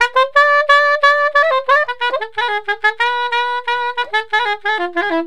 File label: SAX